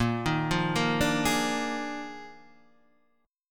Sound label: Eb Major 7th Suspended 2nd Suspended 4th Bb